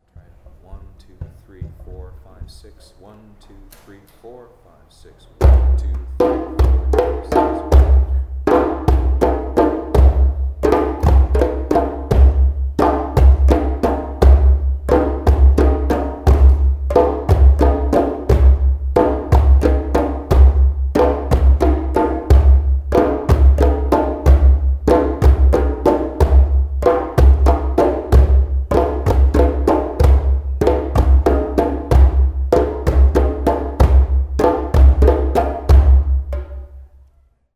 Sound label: 6-8 pattern slow, drumming